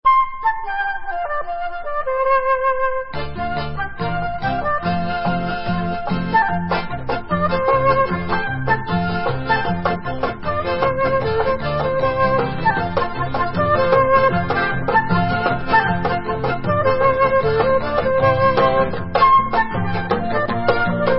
Instrumental

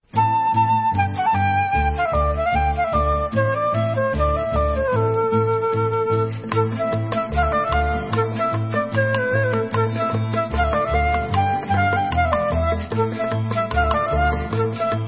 Instrumental

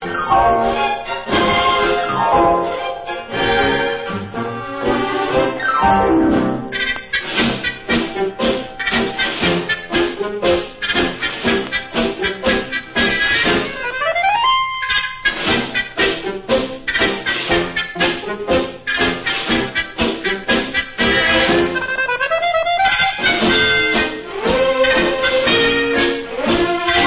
Tango

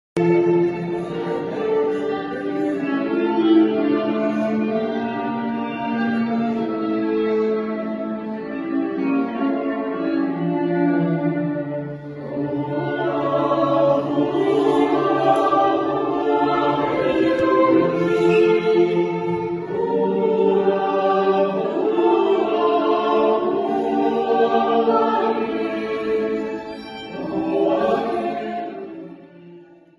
Instrumental, Israeli Dances

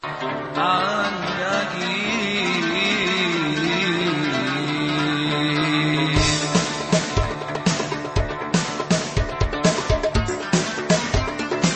Instrumental

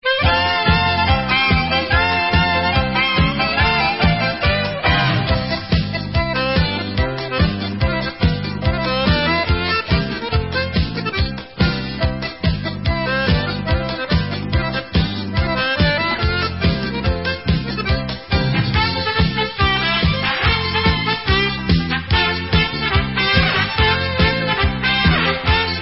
Instrumental

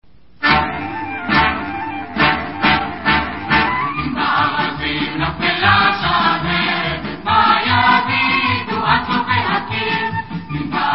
Vocal

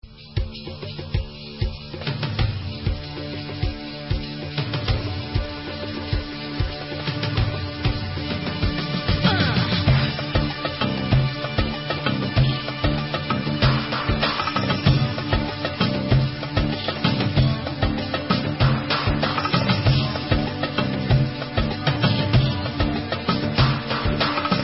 Debka Instrumental

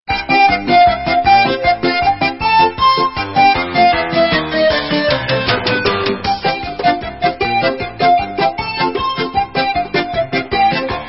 Instrumental

